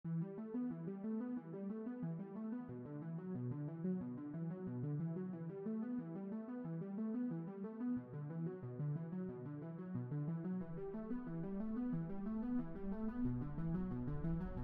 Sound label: Synthwave